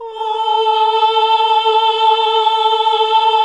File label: OHH A2, CLASSIC VOX6